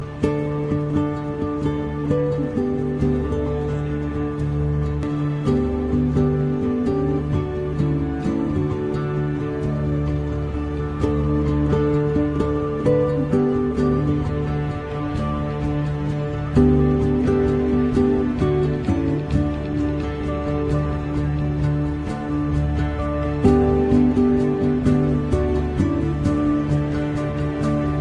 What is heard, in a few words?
2-string